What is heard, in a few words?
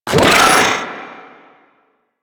Swarm Vocal